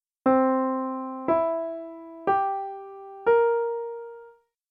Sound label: Treble C7 up